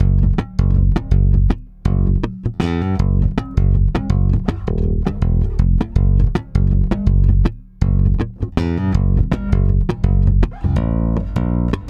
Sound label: STRUM G#, Bass; Spectrasonics; Bass Legends; 88 STRUMMIN